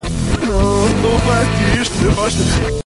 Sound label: trees; reverse